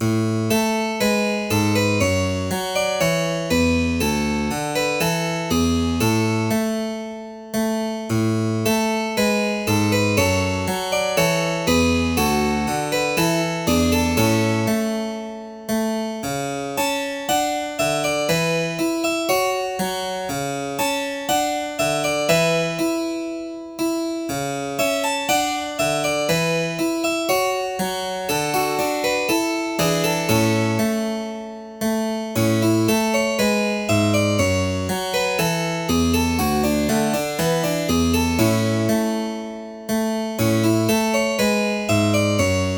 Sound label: prelude in g major piano solo